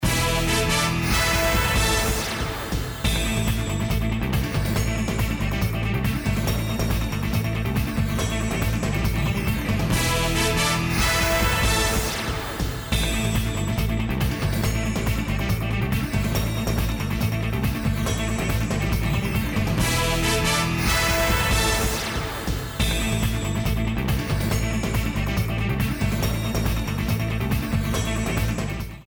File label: BREAKING NEWS; ABC7